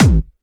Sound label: hardbassdrumz, breakcore; Bazzdrumz